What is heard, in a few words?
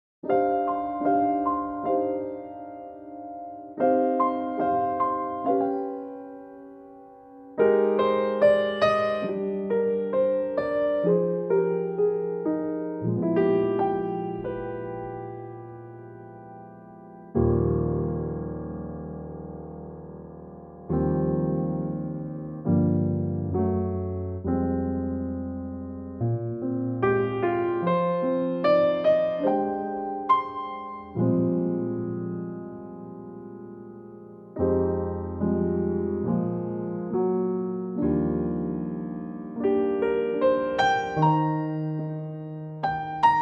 Eb piano